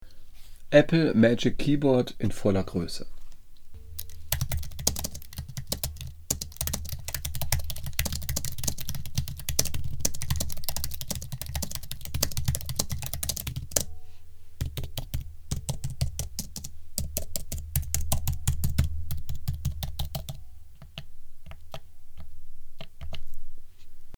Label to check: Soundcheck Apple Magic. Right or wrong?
Right